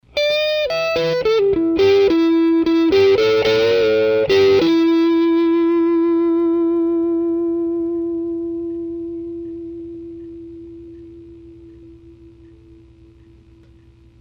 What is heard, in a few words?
mid gain